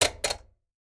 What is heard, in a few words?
Washing Machine Knob, Household